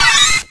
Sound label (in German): Ruf